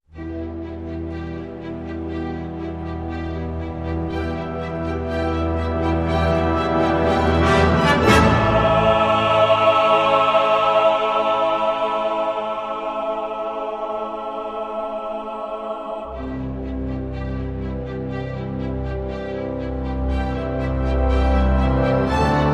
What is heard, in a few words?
Orchestra Only